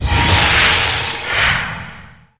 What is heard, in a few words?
Frostnova Target